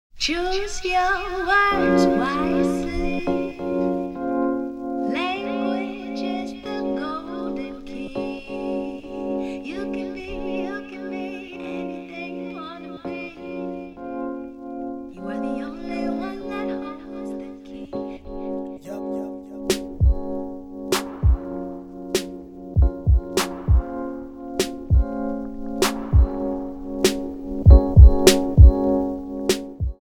Music; BornAgainVirgin; Script to Screen; interstitial

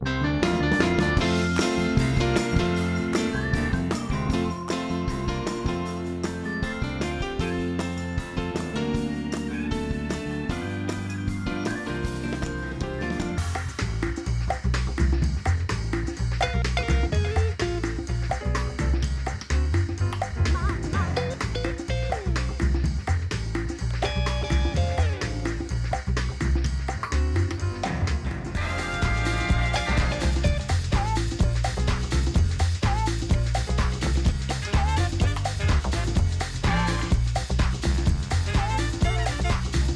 Music; Scatter Reels; Belle's; Scatter Tape Belles Stereo

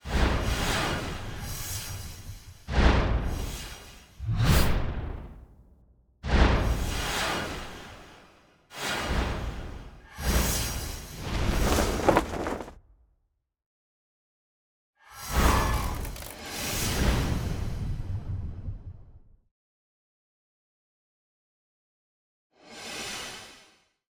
SFX; NewsOneNow; Moving

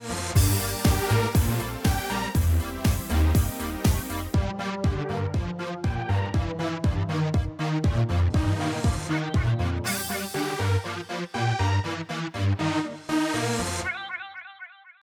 Music; R&B Divas ATL

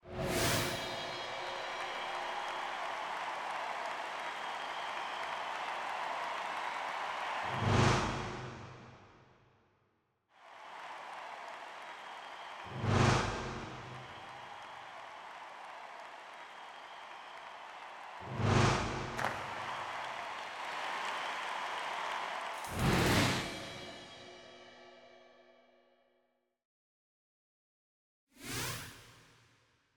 SFX; Front Row Seat

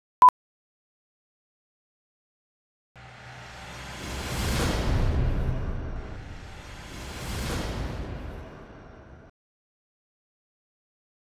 SFX; Empire Memorial Day spot; 2 Seasons

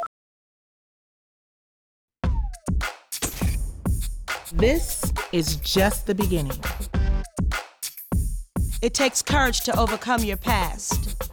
Mix Minus Narration; R&B Divas ATL; R&B Divas S1 Launch REV

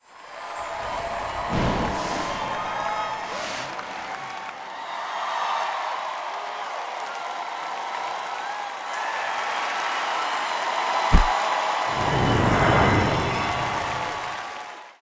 SFX; Trumpet Awards 2013

